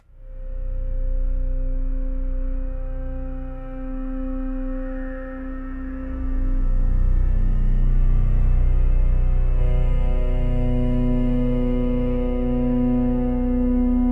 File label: spiritual spooky soundscapes